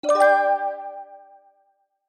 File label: win